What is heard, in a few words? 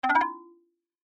pickup